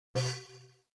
TradeDone; Guild Wars

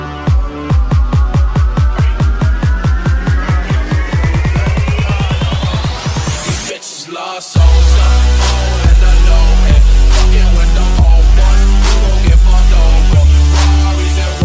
bass boost

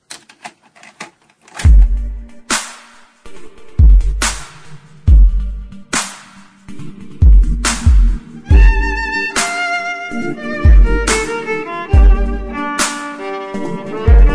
dubstep violin jam

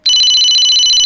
telephone

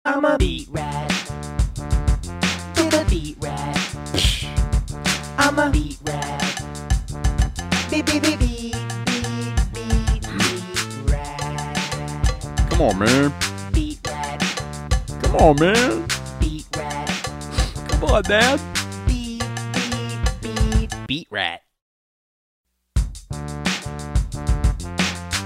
beat

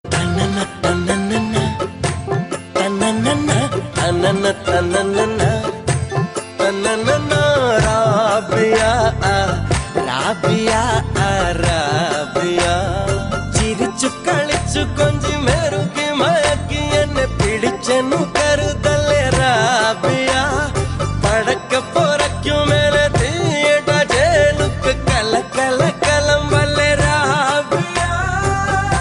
song humming, ringtone; blues

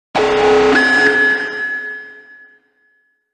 PC Alert

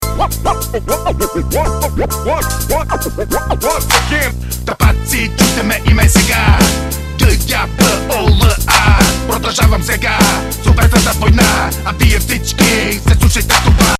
Hip Hop

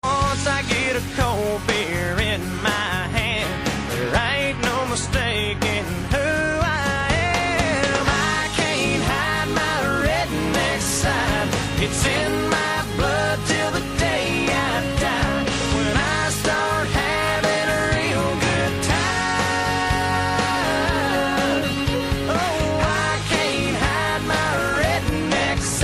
redneck

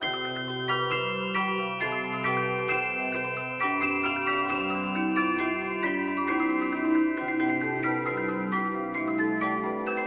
deck the halls

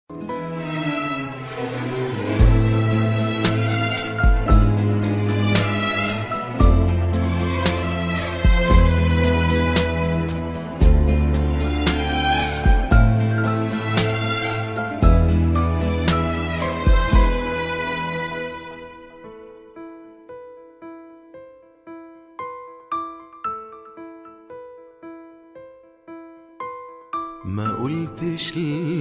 hot violin; blues

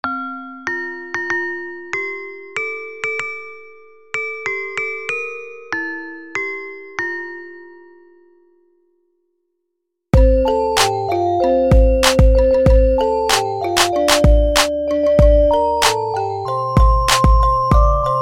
Christmas Beat

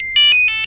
incoming sms icq; alternative

